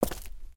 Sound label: Footstep Stone Outside